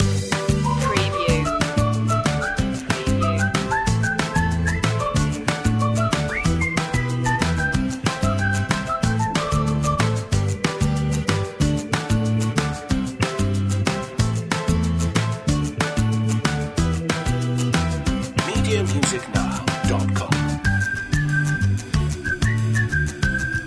whistle beat loop

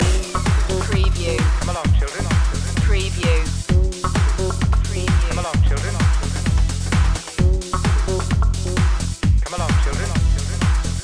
house loop

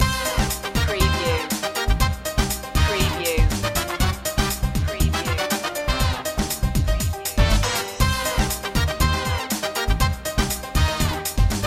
latin express loop

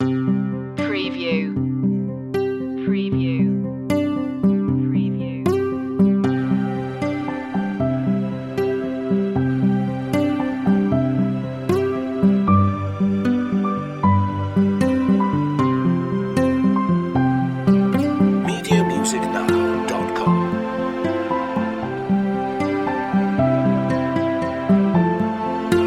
silent night acoustic

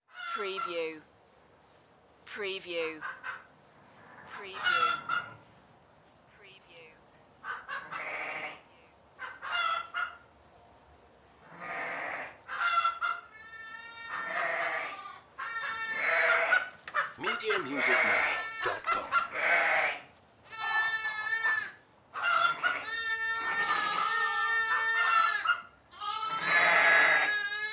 Farm Sheep Chickens

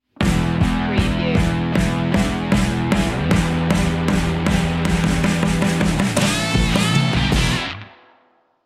southern fuzz stinger

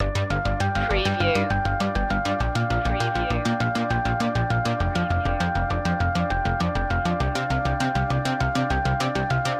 technology loop

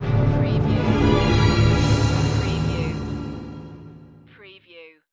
Music Orchestra

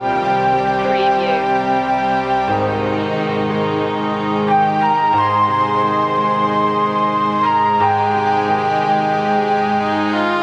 melancholic flute

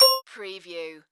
Multimedia Alert

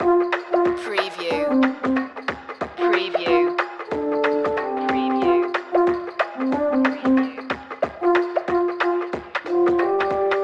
alpenhorn dance loop

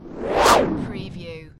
Servo Panel Sound - Swish L to R

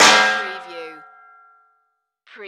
Frying Pan Hit Metal

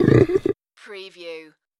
creature vocal

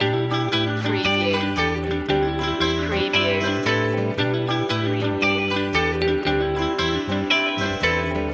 folk loop

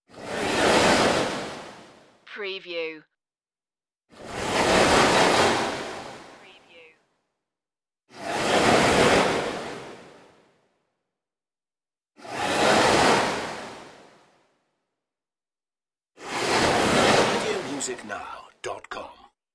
turning transform swooshes

